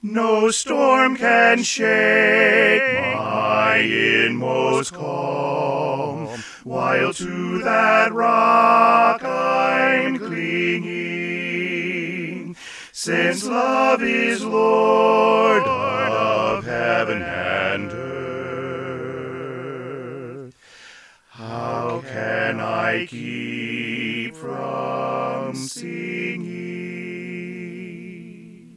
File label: How Can I Keep From Singing Bass; All 4 Parts with The Bass Part Emphasized; McKinney GMC Choir